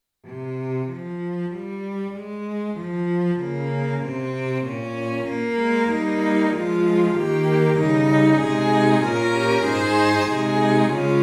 Rcd Fl Cl Fg Str, Tonality：f (♭♭♭♭) Tempo：Quarter note = 96